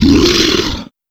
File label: wolf die; wolfteam